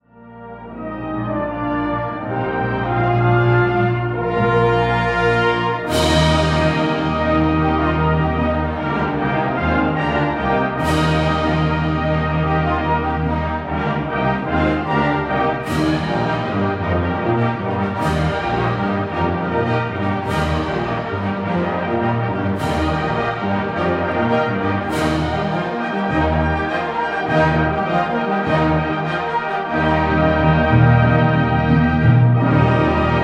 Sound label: Festival Music, Brass; sound-bites